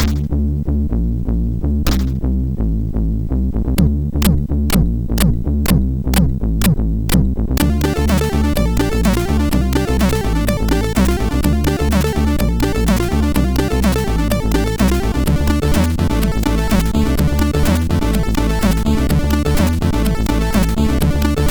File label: Acidlike MOS6581R4; hvsc